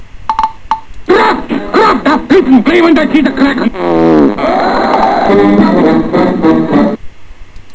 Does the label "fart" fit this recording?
Yes